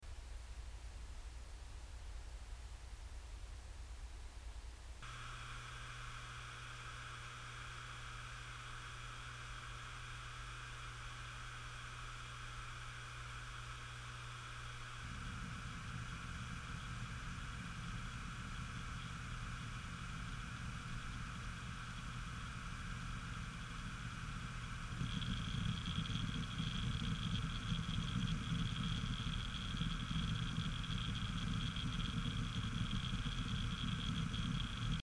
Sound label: wd1001fals 1m